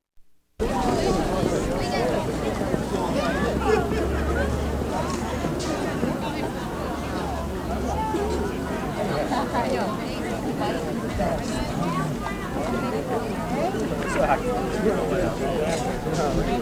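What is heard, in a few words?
live market of people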